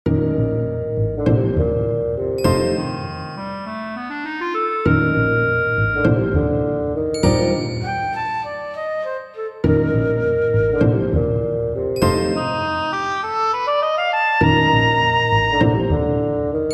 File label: Pizz and Winds